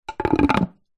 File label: swimming pool skimmer cover stone tile slab on close